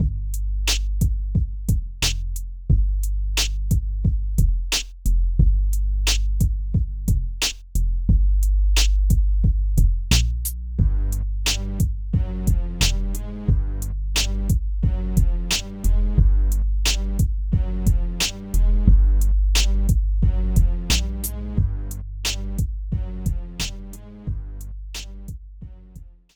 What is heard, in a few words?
Mix clean; Conquering EQ